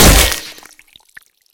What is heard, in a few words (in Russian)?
silnij udar po metallicheskomu predmetu s vitekayushej vodoj